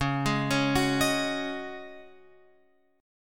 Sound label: Db Suspended 2nd Suspended 4th Db Guitar Open F; arpeggiated; JGuitar